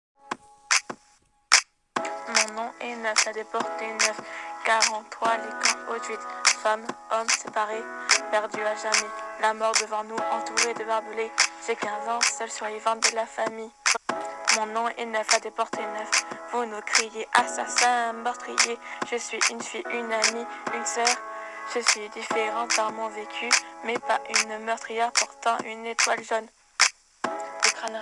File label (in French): le rap